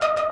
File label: synth